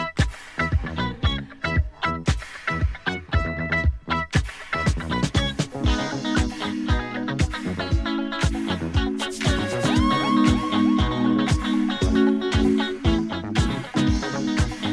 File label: boombox